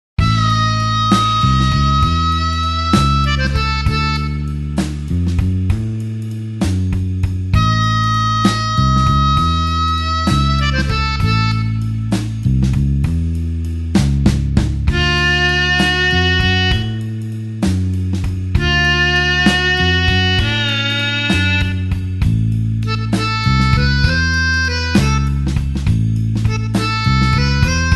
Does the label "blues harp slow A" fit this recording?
Yes